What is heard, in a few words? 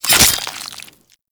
Dagger Slice Flesh; Warframe; Dual Daggers; Hit Effects